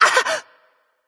grunt hit light; smite; voicepack; hel

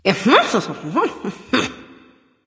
grumbling; smite; voicepack; skadi

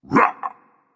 grunt jump berserk; cuchulainn

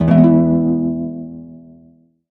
victory lyre; smite; Apollo

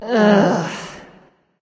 ugh; smite

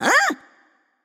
nv confused; cupid

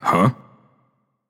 confused; smite; loki

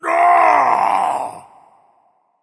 ouch; smite; thor

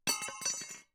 weapon ground; Smite; Sobek

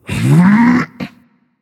nv grunt lift; cernunnos

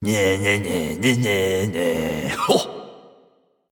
tongue; smite; xbalanque

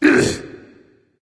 grunt hit dragon; fafnir

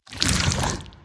slither short; smite; scylla

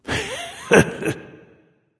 hehe; chronos

